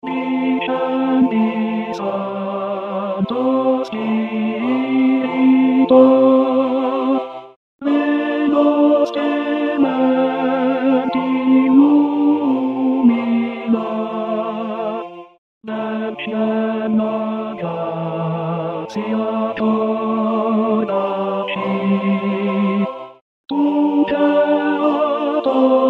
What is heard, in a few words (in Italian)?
tenori; discendi santo spirito